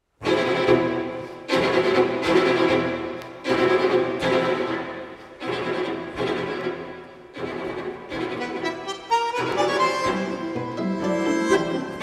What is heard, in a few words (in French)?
quintet CORDES